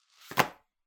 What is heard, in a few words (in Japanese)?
ゴム手袋を置く音